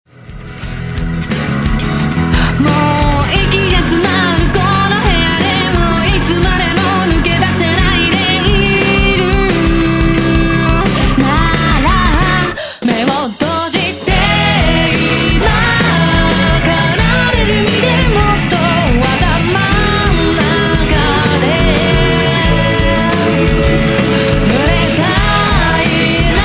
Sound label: music